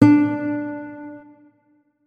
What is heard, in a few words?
notes, guitar; Hideout Furniture